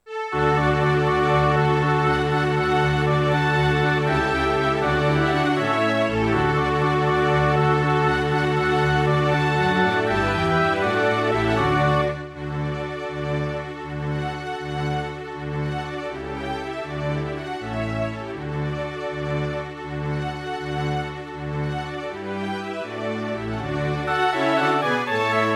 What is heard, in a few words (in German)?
hornkonzert orchester; mozart; トール音楽工房